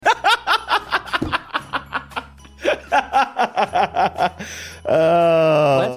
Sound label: laughing and sighing